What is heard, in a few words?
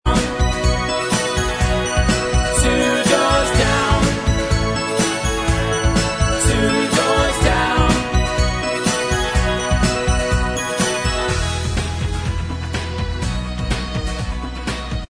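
instrumental track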